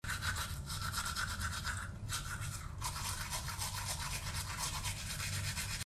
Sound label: brush teeth